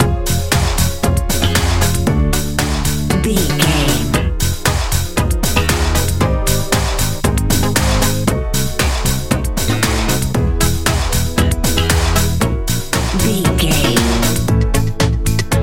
colorful warm echo euro dance

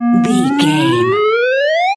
major powerup wet

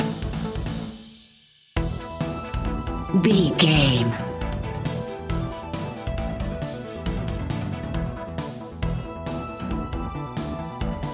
80s synth rock anthem bumper

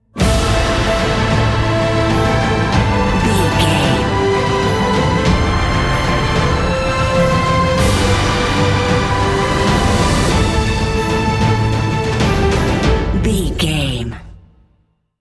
battlefield epic cinematic

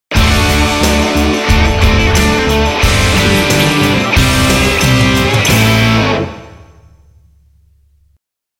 monster trucking stinger

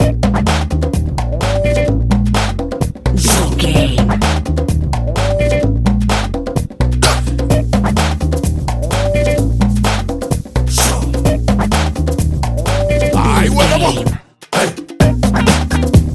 reggae fever

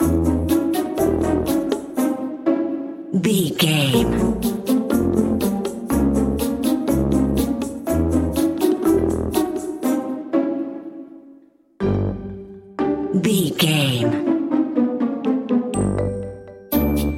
sneaky lurking

